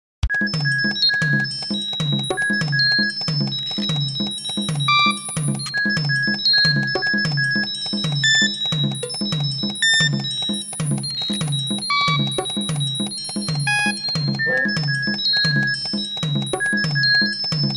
Fibonacci rain shower

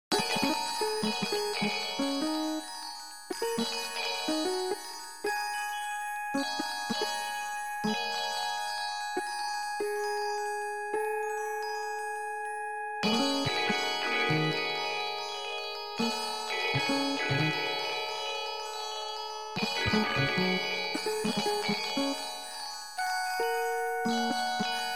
sparse scale jazzy

